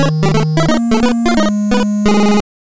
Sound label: lose; pong